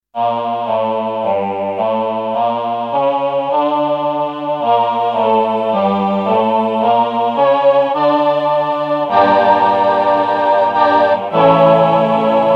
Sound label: roland vocoder plus choir